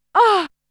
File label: Vocal; ZERO G - Datafile DISC 2